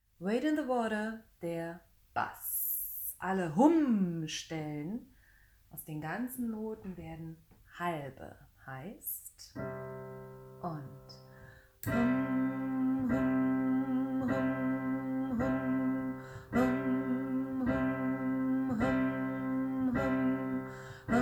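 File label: Wade in the water bass, gospel choir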